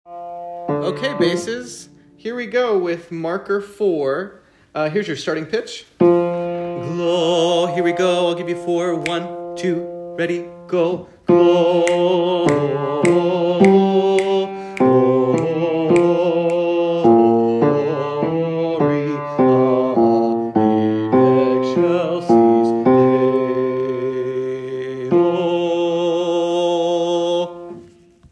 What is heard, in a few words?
Bass, choir